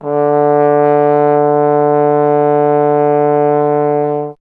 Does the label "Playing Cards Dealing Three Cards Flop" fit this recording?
No